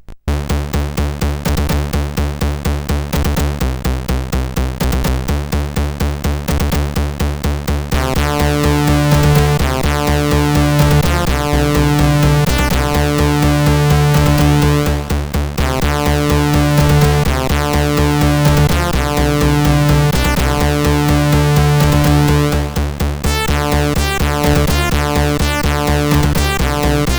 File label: Semi suspenseful Space, CSG8580R5